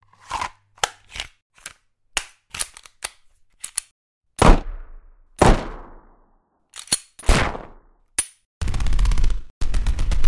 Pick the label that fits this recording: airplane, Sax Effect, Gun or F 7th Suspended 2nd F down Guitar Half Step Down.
Gun